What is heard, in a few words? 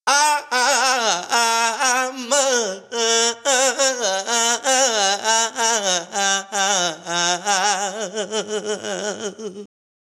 122 IIIIOwhhhhmust Sung